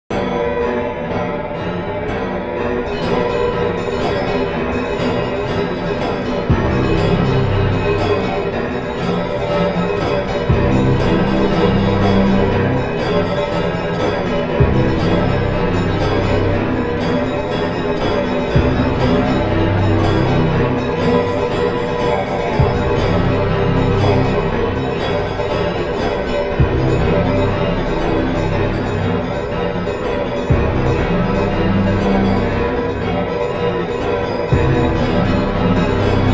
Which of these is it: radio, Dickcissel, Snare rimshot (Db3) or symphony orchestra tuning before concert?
radio